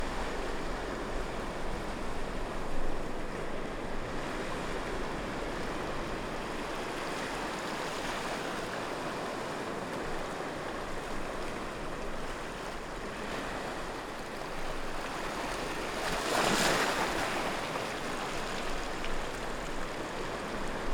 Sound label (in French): mer vent arr rocher la sauzaie proche